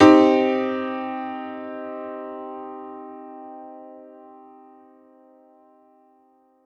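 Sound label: Piano Upright minor hard; TrashLibrary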